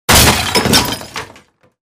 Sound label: Breaking furniture, wood, blows, chips fly